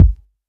puffy kickdrum sound f sharp key

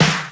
classic noisy snare drum sample e key

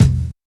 rich mids bass drum c key

